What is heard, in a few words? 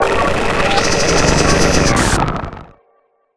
death sequence; dogma